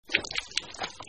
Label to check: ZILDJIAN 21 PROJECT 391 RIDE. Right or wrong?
Wrong